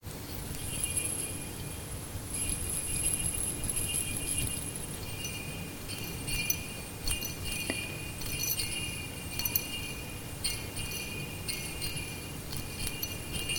worn out ductile tungsten filament rattling around in a now blown lightbulb